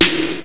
PAD

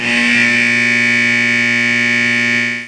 BUZZER

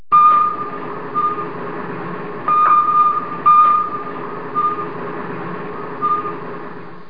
ping; 5THFLEET

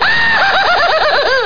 kamikaze; wormnoiz

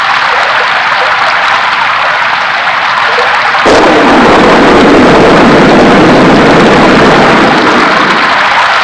thunder and rain; Sound Effects for Multimedia - Volume 1

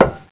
frying pan

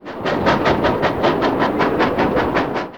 railmv1; TOAWDEMO

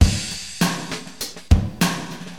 DM LOOP, HipHop, Drums

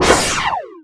laser; Earth and Beyond; EA Games

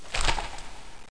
Menu popup; Diablo

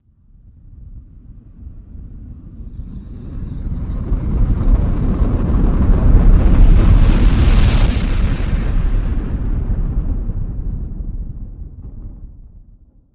Asteroid; ImpGal2

